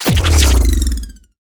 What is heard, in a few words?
chain whip